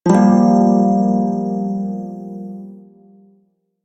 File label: Shawzin Blues Chord; Warframe; Prime Shawzin